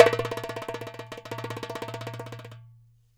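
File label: DARABKA roll, Mediteranean Traditions percs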